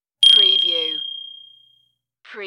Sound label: Clickie